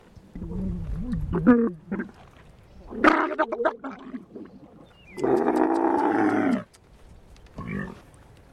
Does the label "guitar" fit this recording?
No